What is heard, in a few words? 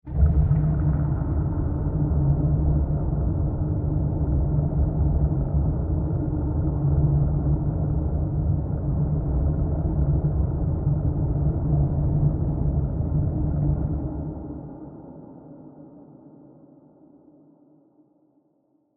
Cinematic experimental water texture